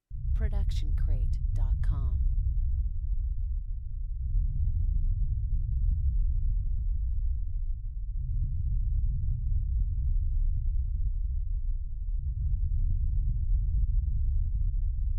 Thunder Rumble; soundscrate

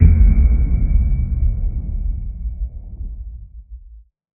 underwater hit; soundscrate

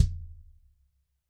bass drum

